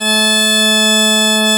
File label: BP BASS A2, Celtic Flavours; USB Soundscan vol.42 - Celtic Flavours; AKAI